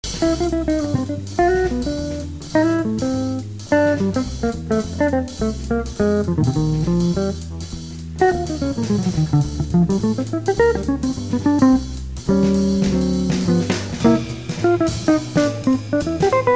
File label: bluesology